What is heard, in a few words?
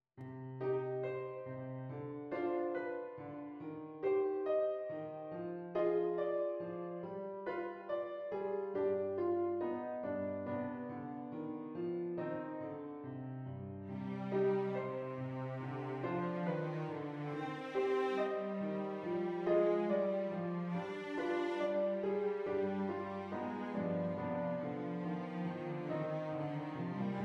Cello